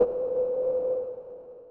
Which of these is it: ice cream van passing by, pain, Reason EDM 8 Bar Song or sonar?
sonar